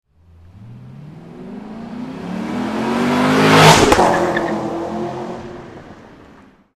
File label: magna drive by